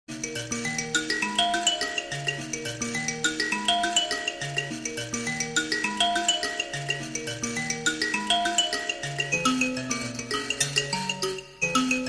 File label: Mbira